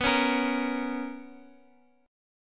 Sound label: B Major 7th Suspended 2nd Guitar Open F, chord; BM7sus2; strummed; JGuitar